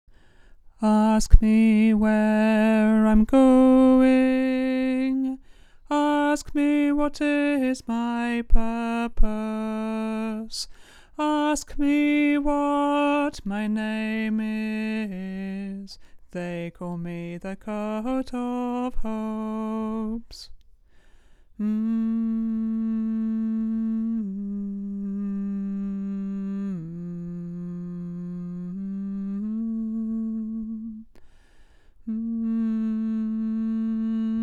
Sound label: tenor